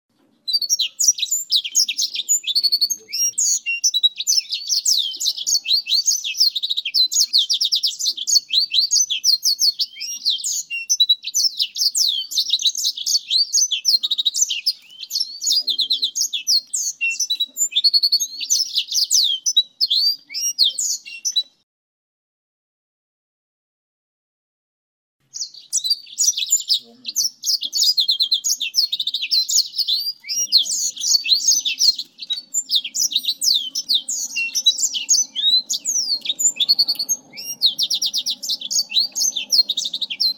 kombinasi ngerol blackthroat vs mozambik

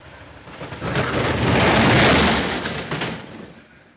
suara mobil

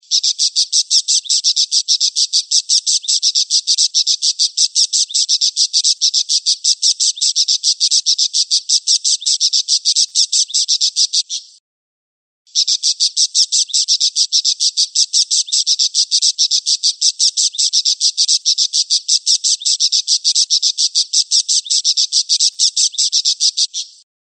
audio parkit untuk kenari isian

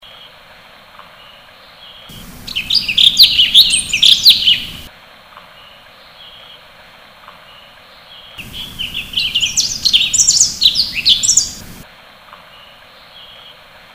suara kicauan cucak cungkok

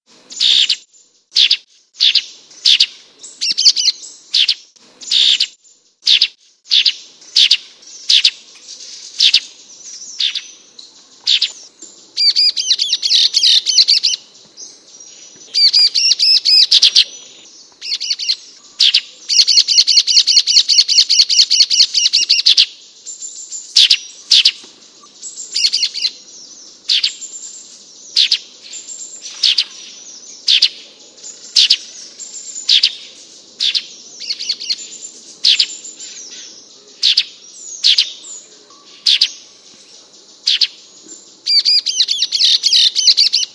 gelatik sultan alarm

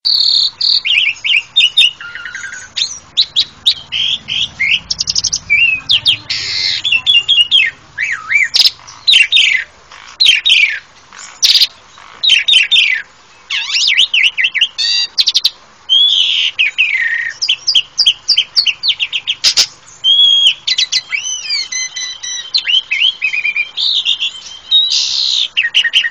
jalak suren

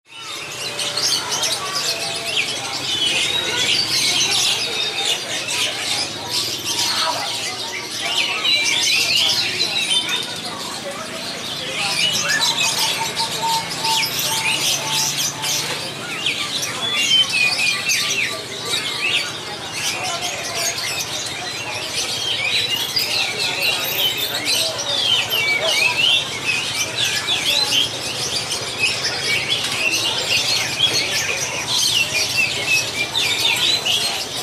suasana pasar burung

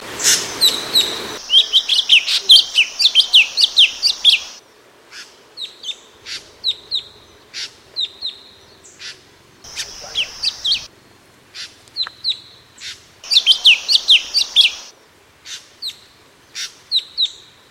sg kelabu

